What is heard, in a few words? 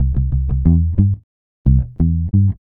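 reggae bass C; Best Service Advanced Media Trax Modern Composer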